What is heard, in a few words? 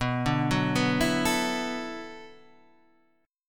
B Minor 11th B down Guitar Standard; Bm11 arpeggiated; JGuitar